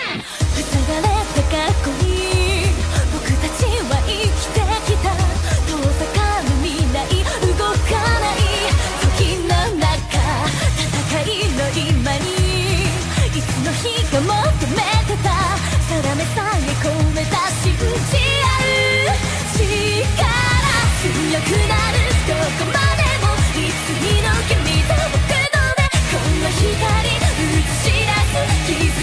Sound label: absolute flute; classical